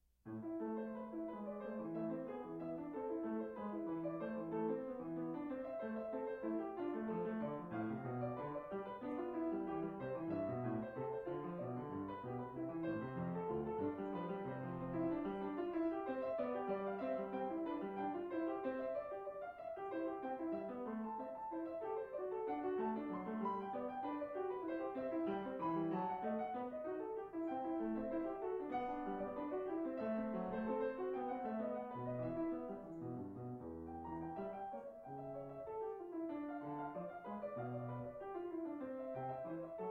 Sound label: Piano